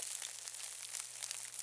Ligh crespy Loop Fire; Puddle; puddle plus ios gamerip 2018

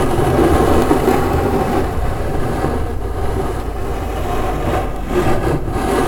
Scrape Glasse Slow Loop

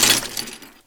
Crackling; Puddle; puddle plus ios gamerip 2018

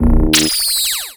Character Attack; MAD

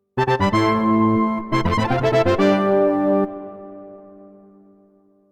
Victory Fanfare [Roland MUNT]; Wizardry 7 Crusaders of the Dark Savant; MS-DOS

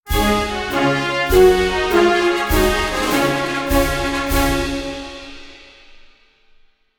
victory

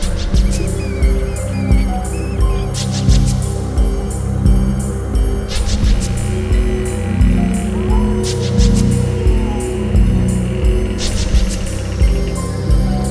Loading; Gore: Ultimate Soldier

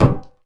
Impact Metal; Puddle; puddle plus ios gamerip 2018

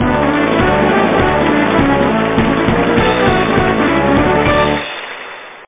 Trophy Fanfare; Pole Position Remix

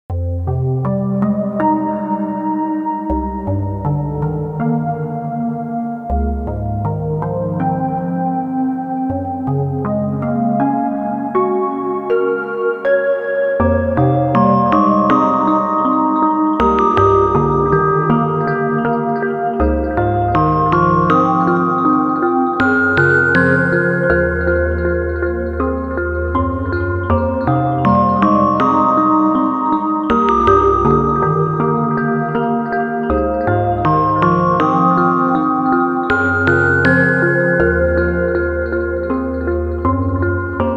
Save Room; Resident Evil Code Veronica

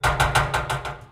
Creak Metal

